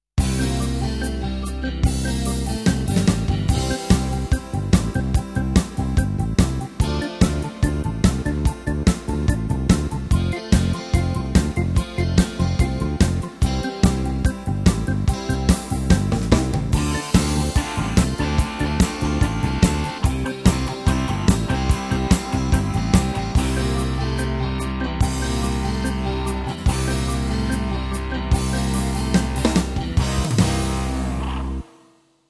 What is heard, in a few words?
Disco Schlager; tyros2; Modern Folk